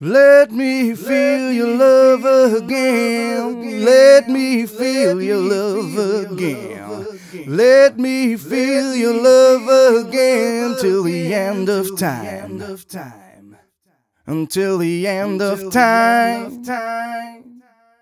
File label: 130 mel g, Vocals